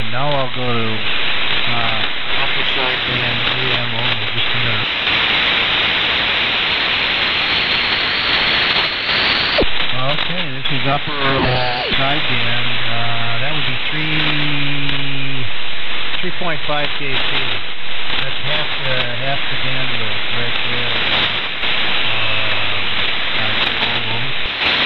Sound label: AM Double vs Upper Side Band